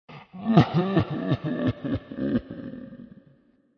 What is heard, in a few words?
Ghostly Sob, A ghostly sob; Devil Voices (Sound Effects:Horror)